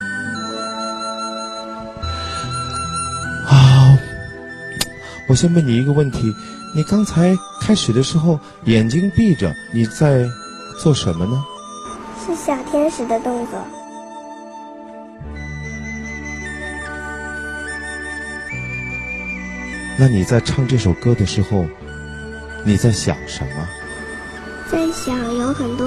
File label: emotional chinese